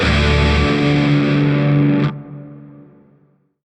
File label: Shawzin Pent Maj Chord E; Music; Lotus Shawzin; Warframe